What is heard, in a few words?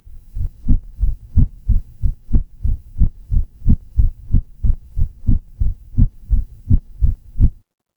horror heartbeat